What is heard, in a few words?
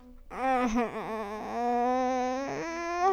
groan